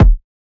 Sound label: Kick; Burner